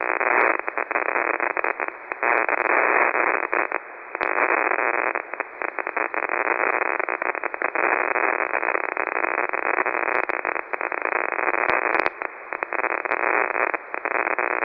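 power line one source ssb am, Same as above showing difference between SSB (first half) and AM mode (second half).; Same direction after cleaning up several sources. One remaining source, still S9+; 6 Meter; N1BUG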